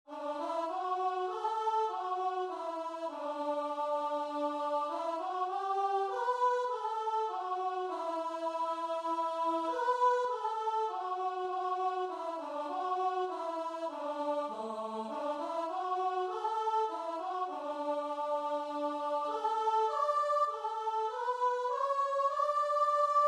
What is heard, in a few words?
my fathers world, guitar and vocal